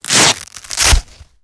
mask rip; phantom

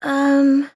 uhmmm; chihiro

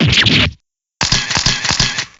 breakdown; aj olga

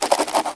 phone; florent

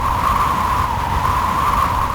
boost loop; sonic

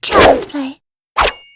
slash

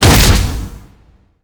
hit; tressa

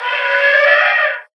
elephant

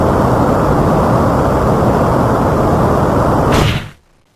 slam; aj; kristoph

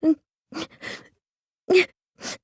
sobbing; chihiro

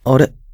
huh; kzibuki

